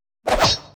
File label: Spear; Weapon